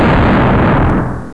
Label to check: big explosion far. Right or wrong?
Right